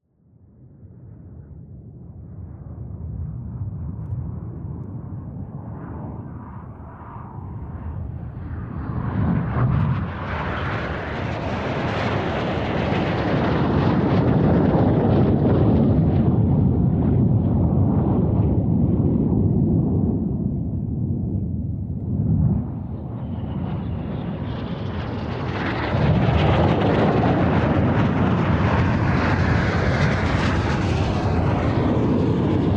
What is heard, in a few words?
Hawk Jets, Over Head Aircraft; Timothy McHugh; Red Arrows